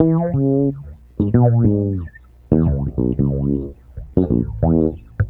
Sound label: Bass Loop, Ambient Grooves